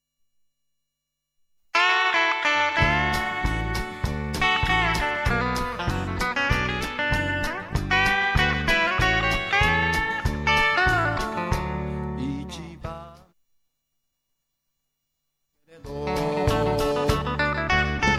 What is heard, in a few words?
strat